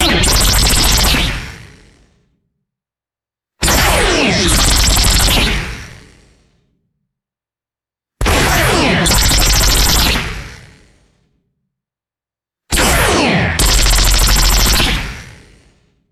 rapid synthesized automatic laser blasts; lasers

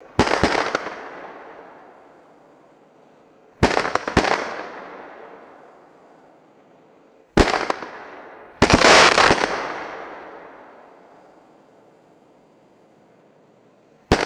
sharp reverberant firecracker pops; fireworks

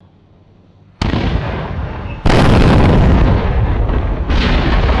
heavy artillery gun firing with metallic ring; guns

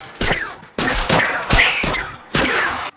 rapid high pitched bullet whizzes

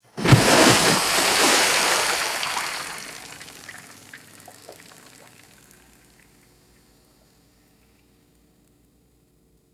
heavy rock splashing into deep water

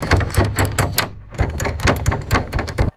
locked door handle jiggling and rattling

